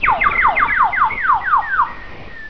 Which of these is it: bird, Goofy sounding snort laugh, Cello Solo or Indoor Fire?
bird